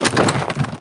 launcher; iris

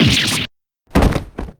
faint; rayfa

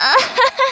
ahaha

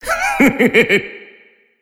giggle; Hazama

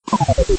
bleep

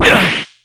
damage; jin

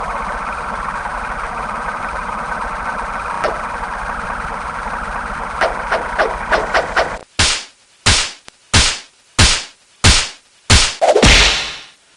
breakdown

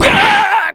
shocking; jin

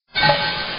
poof

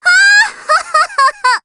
cackle; lambda

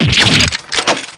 damage; drebber

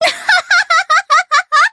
laugh; furfur

